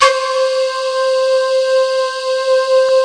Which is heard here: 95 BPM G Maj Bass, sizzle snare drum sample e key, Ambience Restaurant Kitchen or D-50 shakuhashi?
D-50 shakuhashi